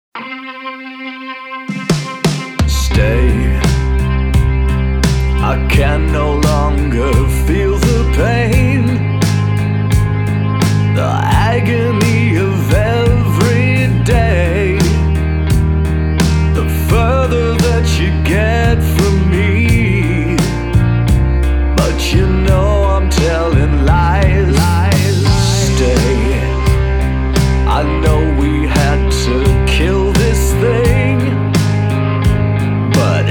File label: rock dry tight